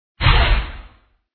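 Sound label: occultist attack contact up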